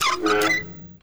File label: valves; tardis2010; garrysmod